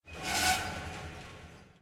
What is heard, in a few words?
Creak Metal High